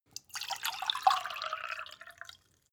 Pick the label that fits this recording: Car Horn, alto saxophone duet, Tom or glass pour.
glass pour